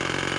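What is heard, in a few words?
drill boor; moonchld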